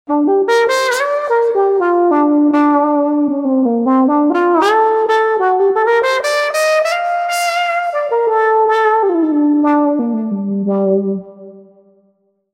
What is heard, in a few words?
Breezer; TURBO VL